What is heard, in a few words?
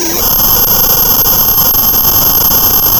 Sound of a cat meowing